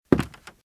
Footsteps - Wood - Creaky - Walk - Left

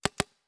Paintball Gun; Sound Ideas; Guns

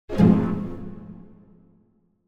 Symphonic Hit Short Pizzicato Finale; Hollywood Edge; sound designer's toolkit v2

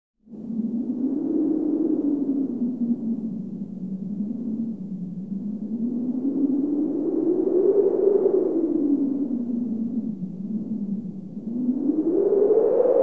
Weather Wind Howling Heavily; KMP Film Video Sound Effects

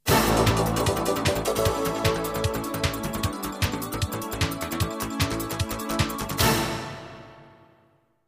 jingles; 90 DDD Jingles

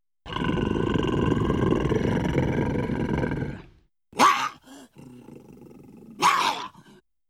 Dog Snarling, Scary Sounds; 1001 Sound Effects; Halloween Horror Sounds Effects

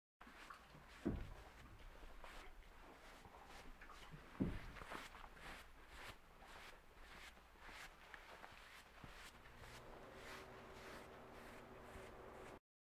Animal effects snorts grunts farm ambience

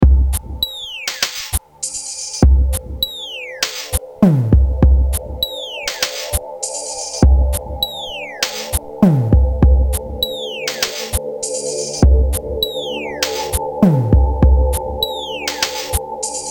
Electro; RoosterFX

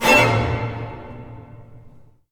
zombie stinger; DeadFright

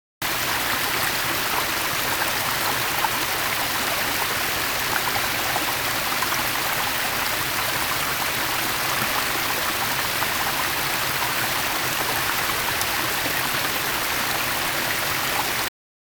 water stream large; best service Blue Box; water streams

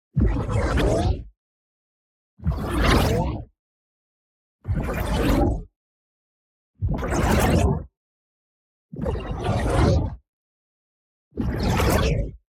ABILITY CHARGE Screen Loading; boom library; Matter

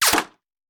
Bullet Impact; Sound Ideas; Guns

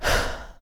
sprint On; Apotheorasis

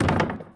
Street Ball Glass Back; SFX Kit; Street Basketball

